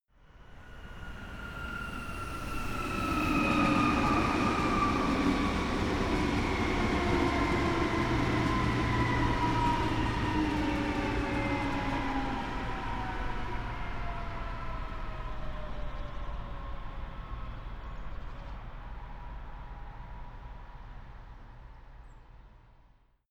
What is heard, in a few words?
train city decelerate train station